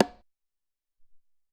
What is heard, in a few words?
Cong Lo, Alesis SR-18, drums